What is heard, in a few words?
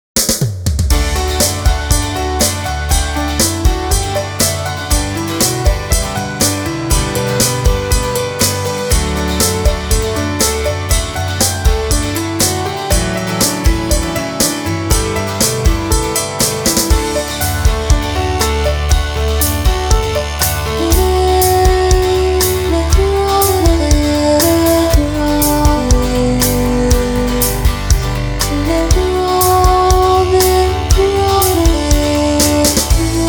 celtic bluegrass